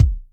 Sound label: Bottom, Yamaha DTXpress Acoustic Kick; drum machine